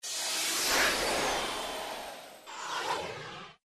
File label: ui power up mono; Motorola; VA76r Tundra AT&T; ui sounds